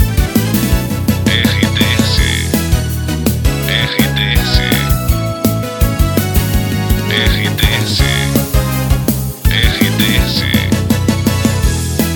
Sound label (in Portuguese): TRILHA PARA LOCUCAO WEB NET POPULAR